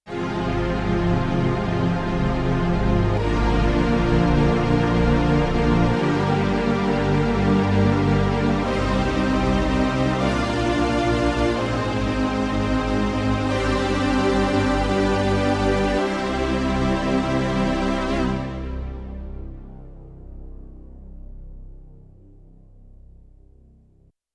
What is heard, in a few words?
Fat Analog Filters, Strings; Yamaha TX16W